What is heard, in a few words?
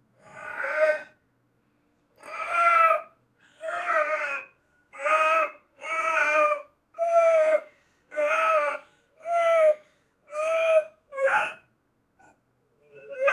an ape is crying